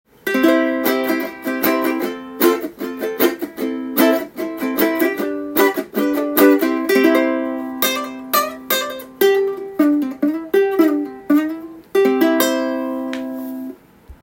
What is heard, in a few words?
martin ukulele; c1k uke